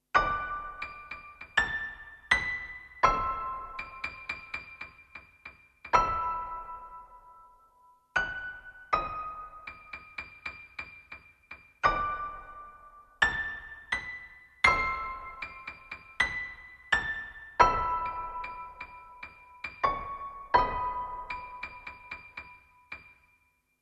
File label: piano